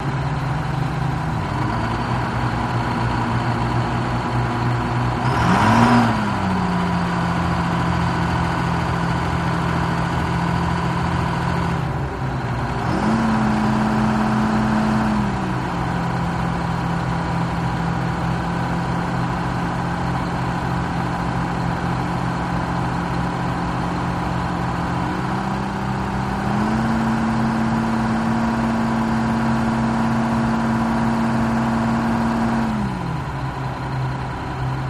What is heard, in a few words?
Diesel Engine Revs